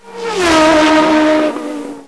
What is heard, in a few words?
flash; PC Gamer 34